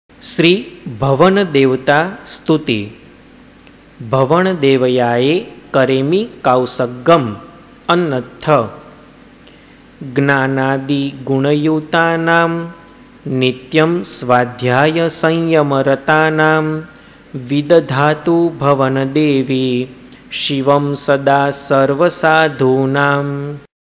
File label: Bhavana Devata Stuti